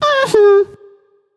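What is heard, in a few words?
nonverbal agree; smite; ratatoskr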